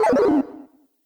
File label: UI Scene Feedback Bad; They'll Come from the Moon